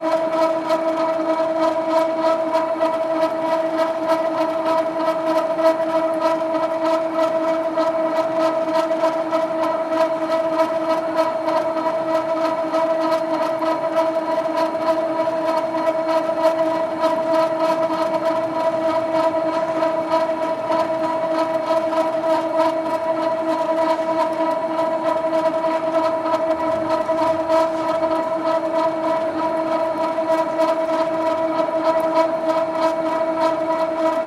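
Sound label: sounds of baggage carousel in airport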